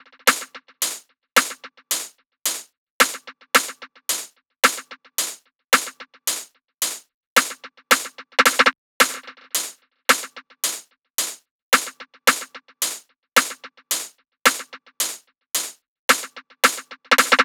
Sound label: SEISMIC hats 110; Hat Loops; drum sample pack; Lipari; Slate